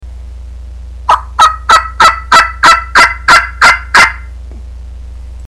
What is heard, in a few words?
Turkey Yelp